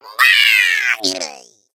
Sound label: jump spin, taunts; player